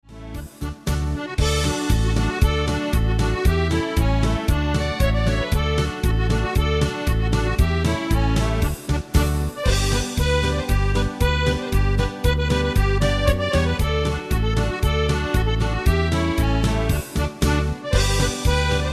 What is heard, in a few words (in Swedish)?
lycklige henriks polka